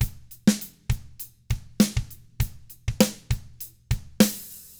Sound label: ROCK, Drums; ILIO; Double Platinum Drums 1